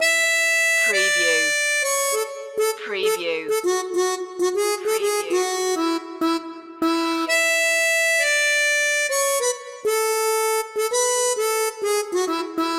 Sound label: sixties flower power loop